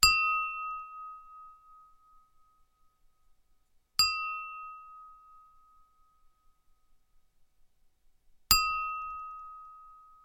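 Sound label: tapping with a pencil on a wide glass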